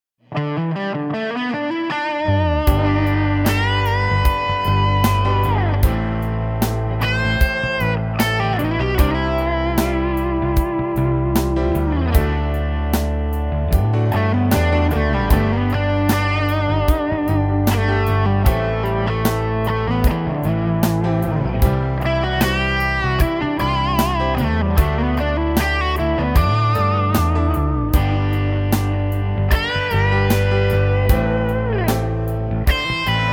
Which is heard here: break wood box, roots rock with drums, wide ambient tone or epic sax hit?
roots rock with drums